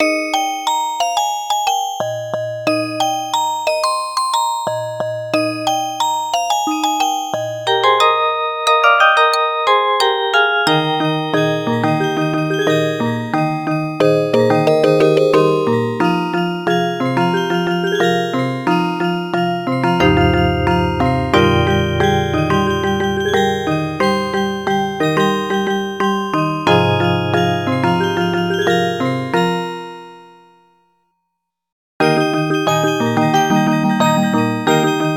Musicbox; Bonus; Heartbeat Original Soundtrack

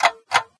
Tick Tock Clock

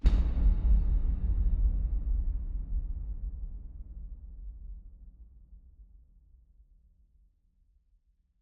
Desert SFX, Desert Mech hit Mountain Boom

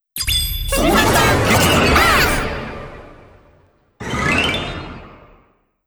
Jingle - Puzzle; master detective archives rain code original soundtrack 2023; Jingle - Shinigami Puzzle

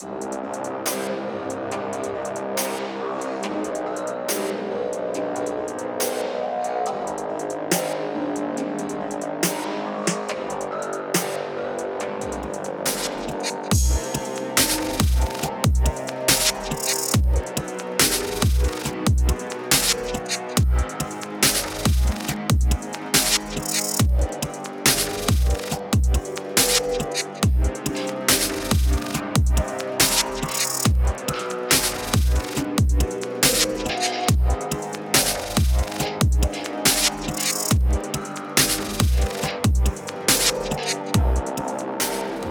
Dubstep Ambient; Chilltheim

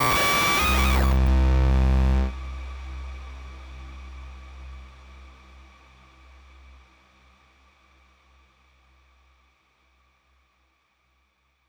Overlay Dark

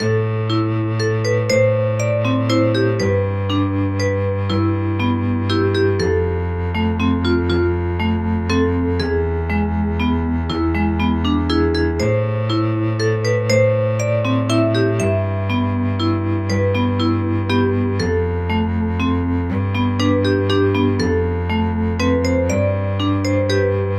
BGM sad; Yuru Yuru Gekijou Gekijouban 2 3

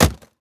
plastic hit

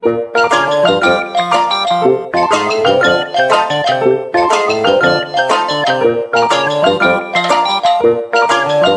Jingle Mail; Taiko no Tatsujin DS Touch de Dokodon

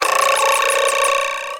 Stars Sound; Jolly Battle

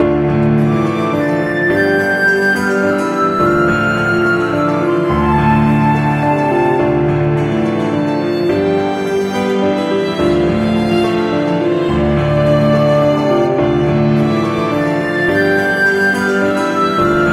Dungeon; Isekai Rondo Android iOS PS4 PS5 Switch Xbox One Xbox Series XS gamerip 2023

